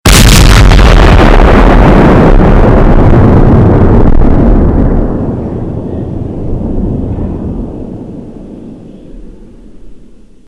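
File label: loud explosion sound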